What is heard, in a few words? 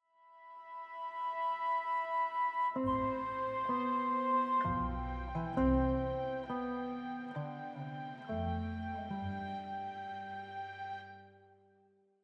kids theme melancholy